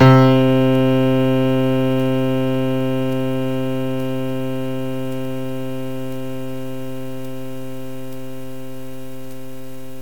piano; lmms

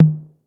tom; lmms; drums

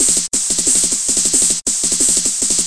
rave snare; lmms

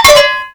melody; gcompris; melody-activity; tachos

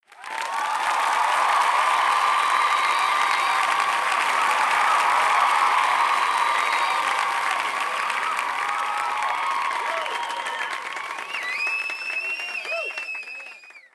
crowd cheers; fofix

